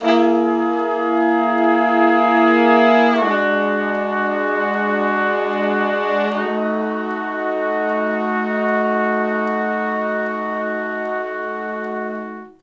Slo Blues Chords [V, IV, I, I] ( B), Horncraft for R&B, Sections; Crimson Blue and Fabulous Horncraft 4 RnB